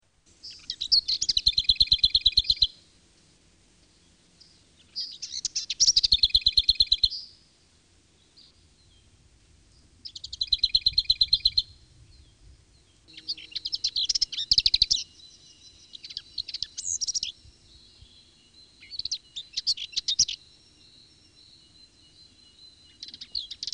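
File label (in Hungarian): Kis poszáta, Madarak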